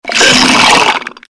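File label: skeleton attack; Skeletal Ravager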